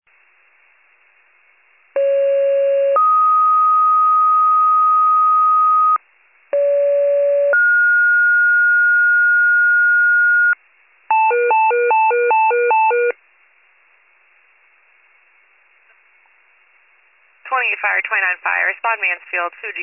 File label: FIRE pager alert